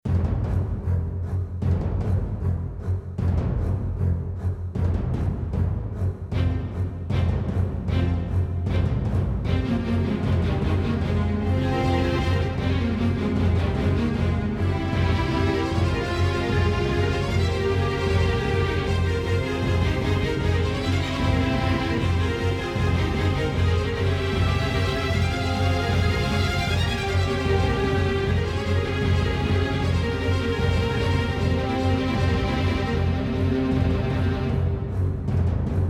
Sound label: bgm